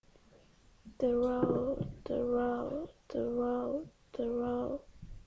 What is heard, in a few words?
throw